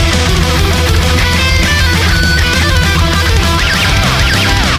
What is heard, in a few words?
guitar gun solo; garrysmod; weapons; mp5 guitar